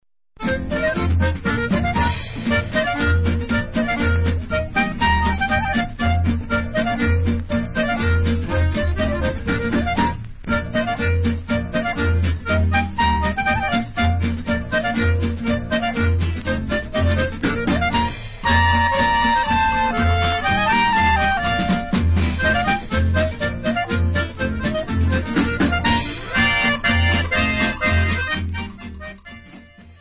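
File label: Instrumental, Israeli Dances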